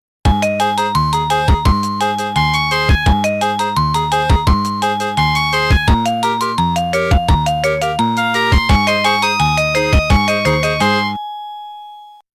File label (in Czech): melodie